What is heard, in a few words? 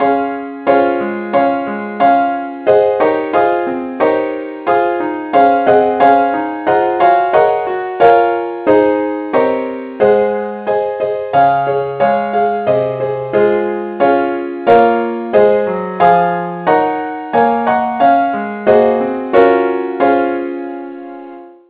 keyboard key cis; saintthomas